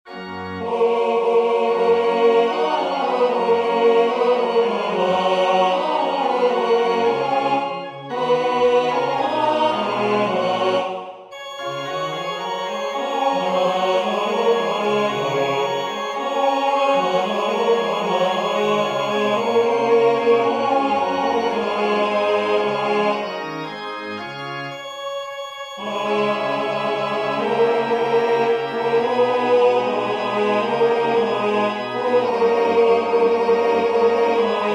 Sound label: tenor; Confitemini Domino; Haydn